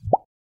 Email Sent; LG; Optimus LTE3

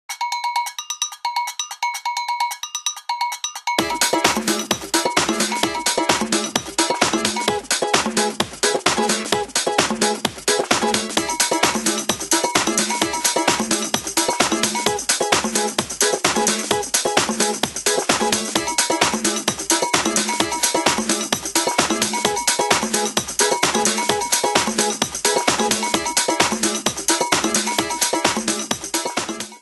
Ring High Tide; HTC Ozone

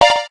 digital, keytone; SKY IM-A600S Sirius

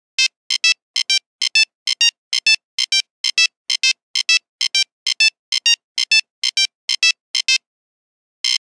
Basic rock, Nokia Buzzer; Windows Phone apps

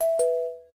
Chime

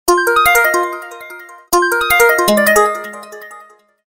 Animato Tone

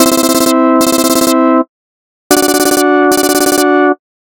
ringtone; Google; Android Open Source Project

